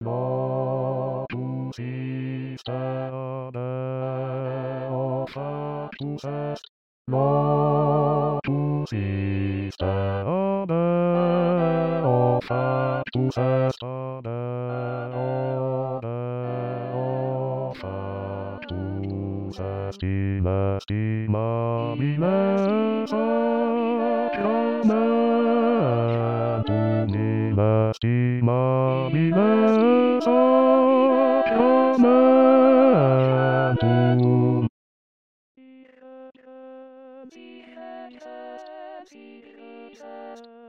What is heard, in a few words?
Bruckner Locus iste bass